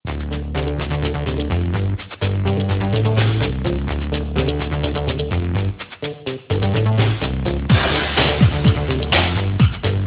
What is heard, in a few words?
ringtone techno, instrumental